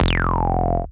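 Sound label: F acid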